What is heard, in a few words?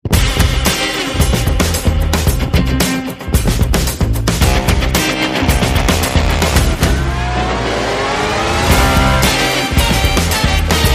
Light Percussion